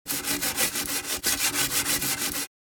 Cleaning scrub brush scrubbing back and forth motion; household